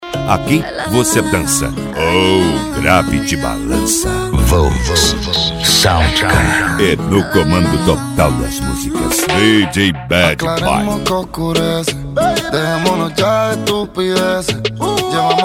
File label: sound car reggaeton; cd volks sound car